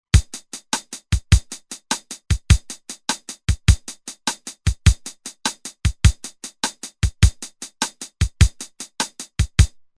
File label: Shuffle, Roland TR-505; Factory Rhythms